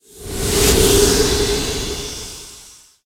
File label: ghost zoom